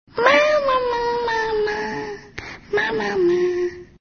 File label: babbling; baby